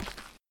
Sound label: step dirt, footsteps